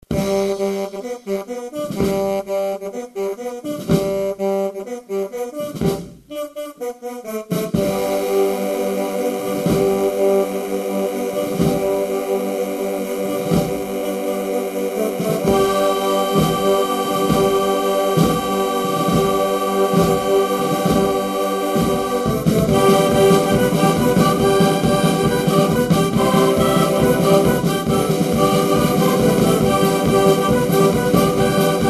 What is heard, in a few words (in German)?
Dudelsack